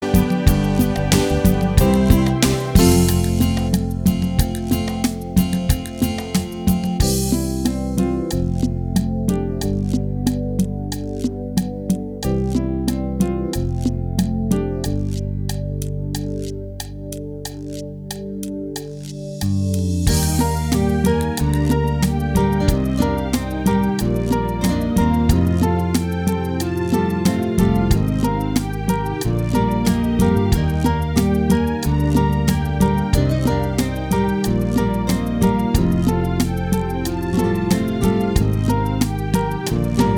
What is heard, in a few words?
bachata